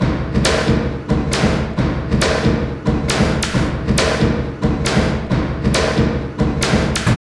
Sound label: LOOP, Tekno Industrial; East West